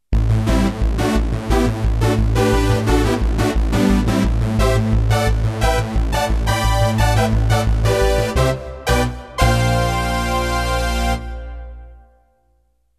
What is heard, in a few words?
yamaha ex